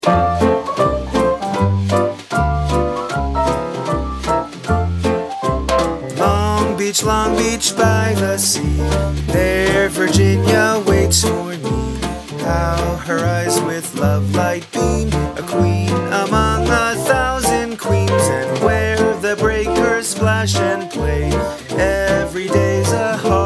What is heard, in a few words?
Sing A Long Harmony